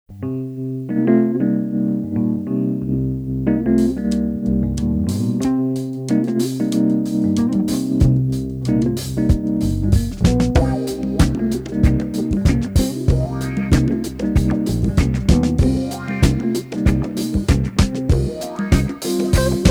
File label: looping